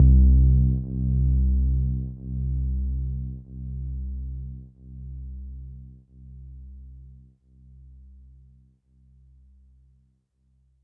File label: Sub C2; Deckard